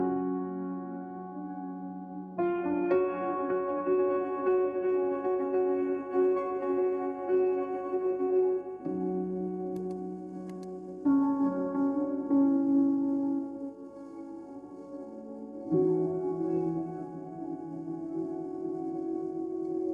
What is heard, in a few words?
ringtone emotional